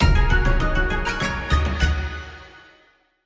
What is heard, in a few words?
large Win; longpao